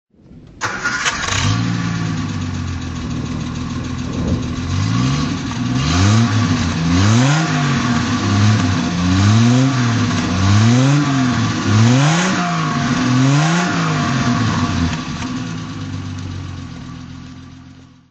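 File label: triumph tr 250 throttle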